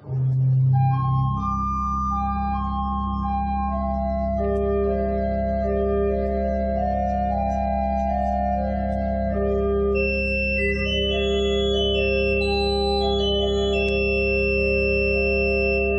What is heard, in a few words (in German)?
Orgel; SanktMartinuskirche Nettesheim